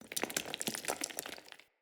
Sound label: Amb Ruin Stone Crumble; Ambience; Mendicant Area